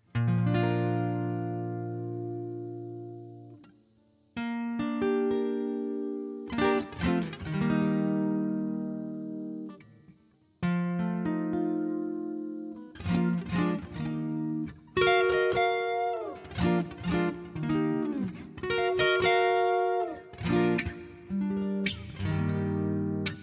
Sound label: (Bb) No Backing Vocals